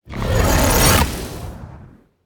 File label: Monster; Zenless Zone Zero